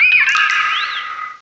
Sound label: cry; source of the sovereign